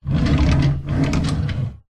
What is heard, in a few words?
office drawer wooden open or close